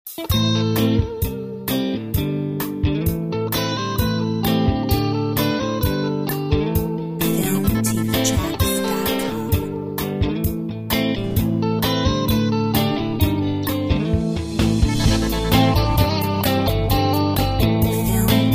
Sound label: Guitar; Filmtv-tracks